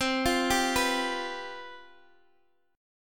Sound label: C Major 7th Suspended 4th, Guitar, Half Step Down